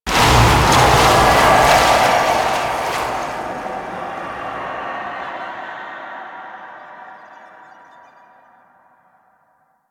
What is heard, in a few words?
Hex Melt; gameplay; Warframe; Nineteen Ninety Nine; Stage 11 Future Past